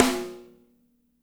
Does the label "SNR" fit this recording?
Yes